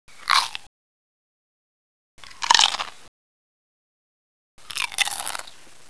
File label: munch